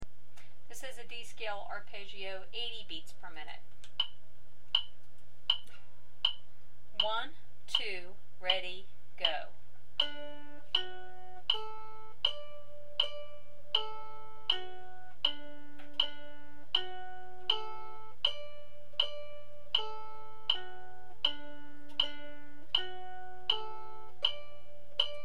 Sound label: D Scale Arpeggio 80bpm, mandolin